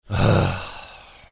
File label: heavy sigh